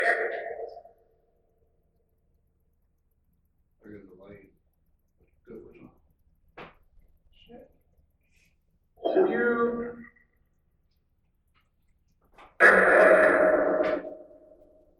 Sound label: Hallway Phasma Box